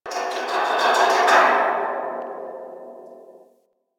Landscape Construction Site One Off, Ambience; Warframe; Venus Open World; One Offs

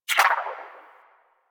Resonantor Cancel Move; Augment; Warframe; Bard

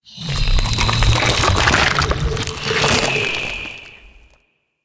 Vendor Retract; Warframe; Infested Eris; Ambience

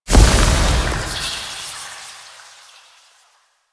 Shadow Smoke Death; Warframe; Duviri

